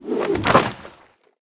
net

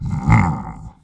giant

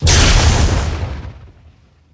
lightning

